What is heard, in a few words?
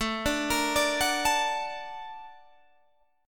A sharp Augmented Major 7th A, guitar